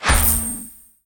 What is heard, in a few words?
Wand zap; 100rogues